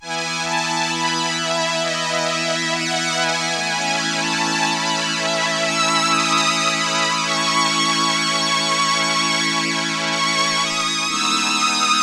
STRINGS II with slow attack, vibrato, sustain, and Ensemble I, Roland RS-202